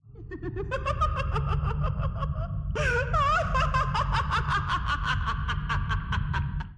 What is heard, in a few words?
Groan